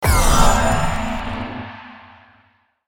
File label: cast; cstrike; war3source; vengeful